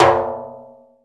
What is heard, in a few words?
RIMSHOT, Timbale; Timbale Battery